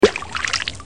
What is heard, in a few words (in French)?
eau